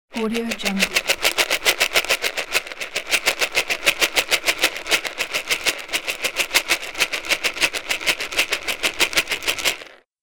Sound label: corn seeds in bowl movement